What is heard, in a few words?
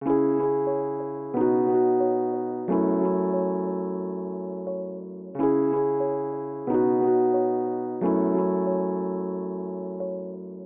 Classic Melody Loop - 90 BPM C# Min Keys; Melodics; Loops; Loop Stems; Cymatics; Eternity